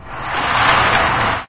door metal gate move, doors; Source Engine; video game

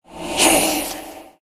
sword hit

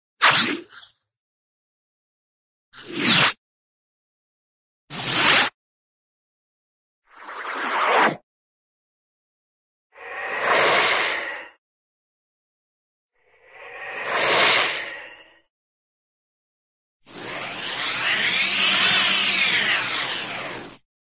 swoosh, cartoon big swoosh

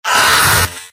scout pain sharp; tf; mvm

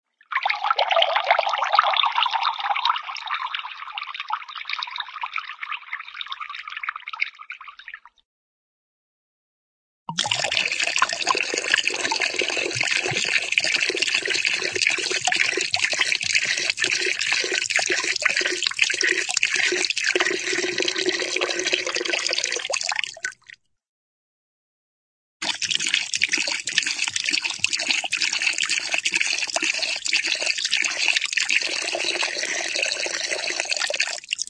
pour pour water into cup; cartoon

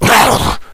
rage at victim, infected

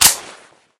rifle full auto button; Left 4 Dead; Source Engine

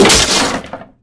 wood box break; Source Engine; video game; physics

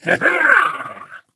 ballbot jump spin

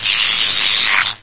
pain, antlion; Source Engine; video game; npc

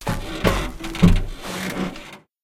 enter driver, vehicles junker; Source Engine; Portal 2

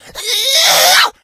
rage at victim, infected, male

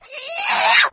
rage at victim, infected female

